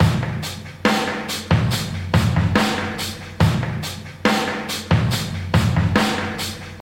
71 bpm drum loop sample d sharp key, Free breakbeat sample - kick tuned to the D# note.